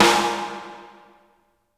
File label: AMBIENT A, Drumworks; Sampleheads; New York City Drumworks VOL-1